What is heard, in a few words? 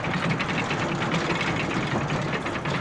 rotating; cstrike